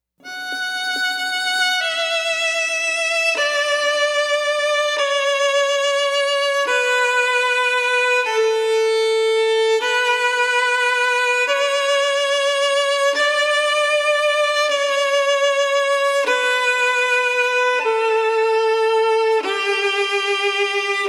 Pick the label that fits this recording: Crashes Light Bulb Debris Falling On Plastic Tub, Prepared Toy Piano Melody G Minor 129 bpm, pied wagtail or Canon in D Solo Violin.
Canon in D Solo Violin